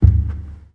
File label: large, steps; quakemapping